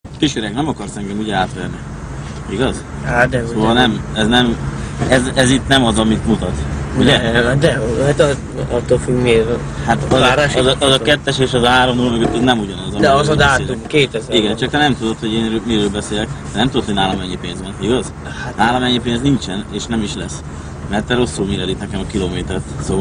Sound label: Sub Bass